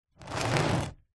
household book large old hardback slide on gritty hard surface; zapsplat